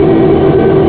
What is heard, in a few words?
Coolant Pres Inc